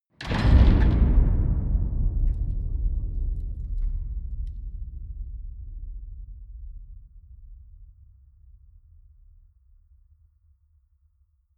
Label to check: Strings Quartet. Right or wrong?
Wrong